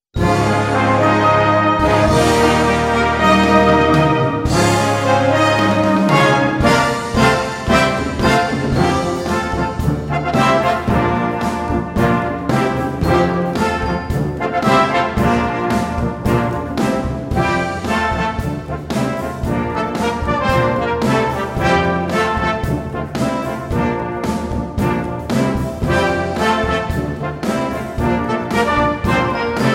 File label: Reggae